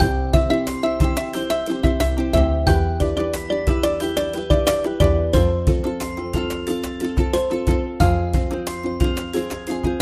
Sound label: Ukulele Song; Hold Music